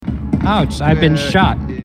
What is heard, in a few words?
ouch